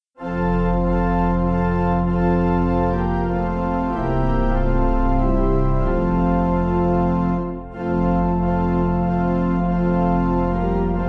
Pipe, Free Music Backing Files for Churches - Piano, Band, Pipe Organ; Praise And Thanksgiving; Lobet Und Preiset